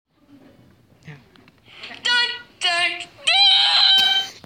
kid screaming

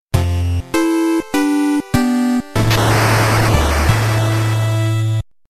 3d Video Game Sms

